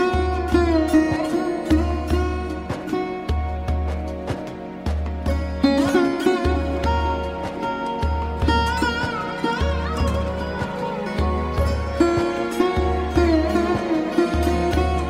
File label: hindi ringtone; bollywood ringtones